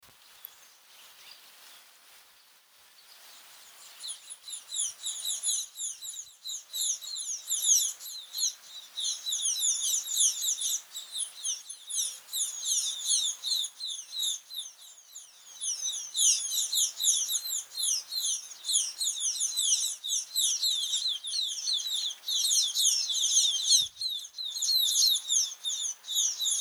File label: Munia Sound